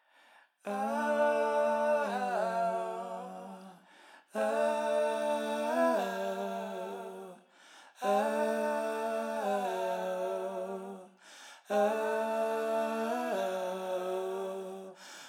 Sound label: Song Vocals Unmixed